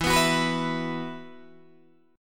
E Suspended 2nd E, Guitar, DADGAD; down

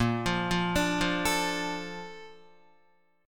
A sharp Major 7th, Guitar; A#M7; arpeggiated; JGuitar

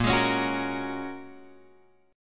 Bb Minor 7th Sharp 5th, Guitar, Drop D; 8,11,11,11,11,x

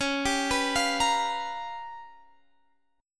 G 7th Sharp 9th Flat 5th

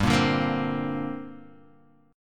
D 7th Suspended 4th Sharp 5th, G

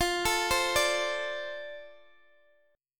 F 6th, Guitar DADGAD; F6 arpeggiated; x,x,15,14,15,12

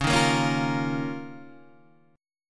D sharp 7th Suspended 2nd C sharp

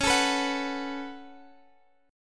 Db 7th Suspended 4th Sharp 5th Db, chord

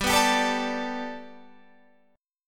G Major Add 9th, Guitar, Standard; 15,14,17,14,15,17; Gadd9 strummed

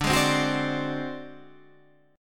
G sharp 7th Flat 5th D, chord

